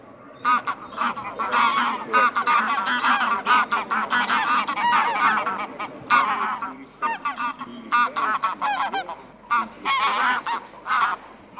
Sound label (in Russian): Гуменник базар Oche Granaiolle; chip mini 234 plurifon